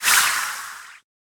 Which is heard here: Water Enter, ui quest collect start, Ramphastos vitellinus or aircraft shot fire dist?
ui quest collect start